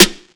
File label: snare one shot noise